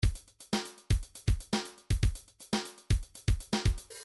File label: funk 2bar, midi